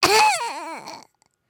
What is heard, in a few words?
Die; Lego Strombie; creatures organic